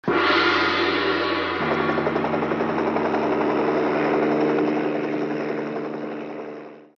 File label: gong